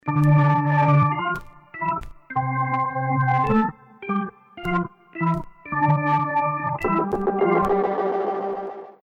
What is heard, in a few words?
deathcam